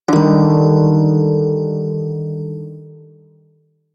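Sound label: Prime Shawzin Minor Chord E; Music; Warframe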